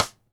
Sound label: Ludwig Supraphonic Snare Cross Stick Room; Sonic Foundry (Sony Creative Software) - Vital Drums